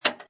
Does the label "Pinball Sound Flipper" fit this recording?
Yes